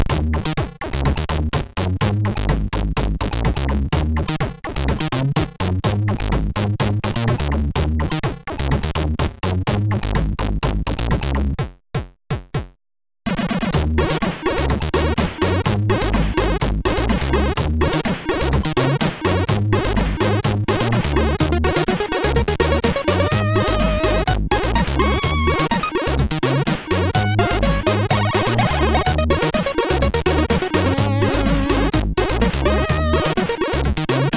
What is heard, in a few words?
Intro Tune, SID tunes